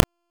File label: Cloak Remove; Warframe; Frumentarius